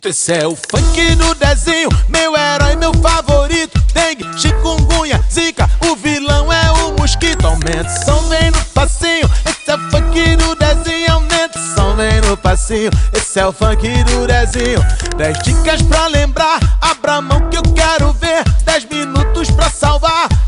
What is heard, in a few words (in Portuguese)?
Funk